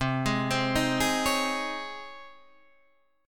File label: C sharp Major 7th Suspended 2nd, C